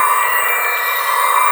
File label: item regeneration potion loop; LoL; items